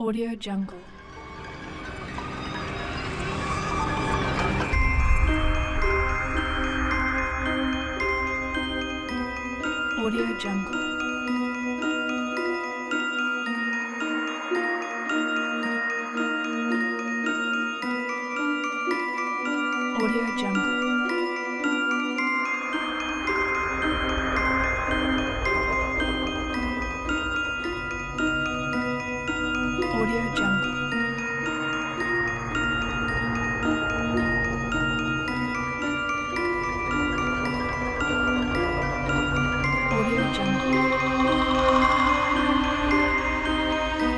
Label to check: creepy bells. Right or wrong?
Right